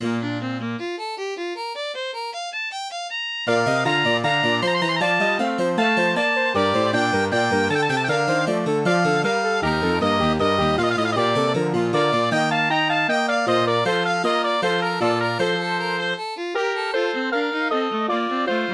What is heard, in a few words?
MIDI; BWV10